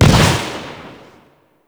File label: Arcane Melee Shockwave Sphere Explosion; Warframe; Mods; Proc